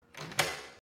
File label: Lock Click; GeoSusGame; KeyInsertMinigame